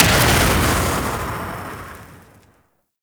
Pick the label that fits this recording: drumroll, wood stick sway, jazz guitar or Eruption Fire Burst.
Eruption Fire Burst